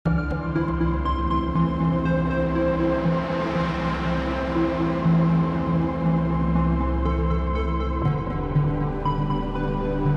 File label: Pro One Synth Loop